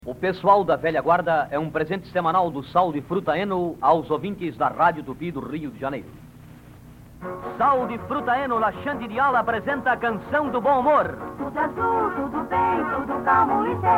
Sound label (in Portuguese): Jingle Sal de Fruta Eno